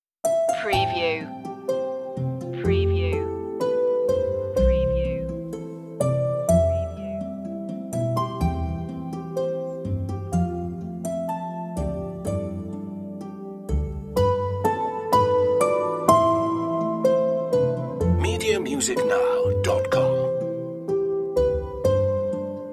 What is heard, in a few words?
sleepy lullaby